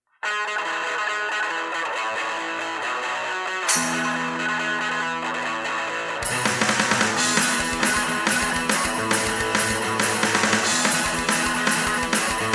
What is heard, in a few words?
kickstrike never chosen kick strike